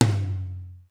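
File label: mid room tom; sr16 tom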